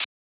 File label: Shaker; FLPRO